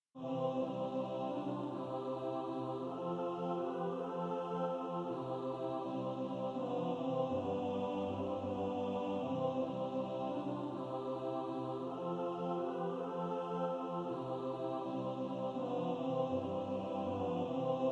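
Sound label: alto, practice music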